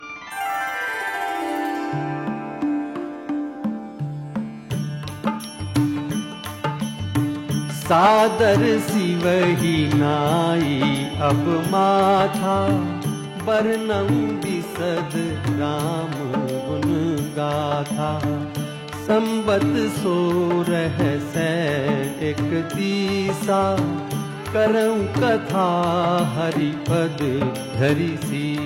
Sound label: Ringtone